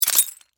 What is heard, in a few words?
Crash, Glass, Indicator, Lamp, Crack, Under, Pressure